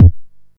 Bass Drum, Jomox Xbase-09

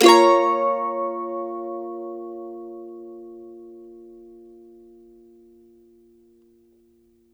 CEE CHORDS A#M, Blues Guitar Samples

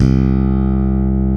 PRE-BS C3F, PRECISEBS; Drums In Your FACE!

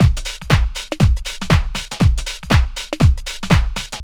house loop

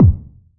BD, BASS DRUMS; EdgeSounds

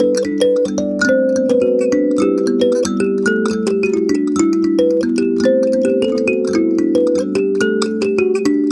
Kalimba, African

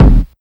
Kick; Sonic Foundry (Sony Creative Software); Esoterik Beatz; Quesy Kit

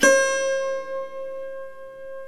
SAROD

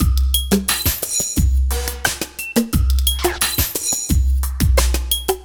88 CAT LP 1, Industrial Loops

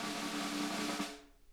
VINT KITS; Sonic Reality - Vintage Timetraveler

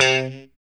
POP C 3, STRAT guitar; Spectrasonics; Hans Zimmer Guitars VOL-1; JC-STRAT POP

